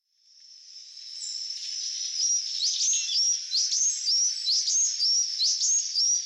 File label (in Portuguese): canto do beija flor azul de bico de foice